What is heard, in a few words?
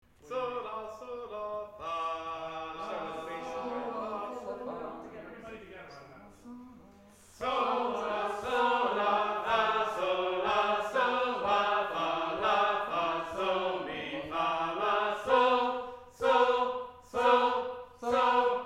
Fire Alarm